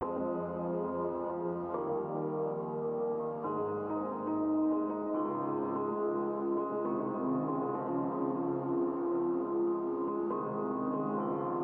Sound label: Melodic Piano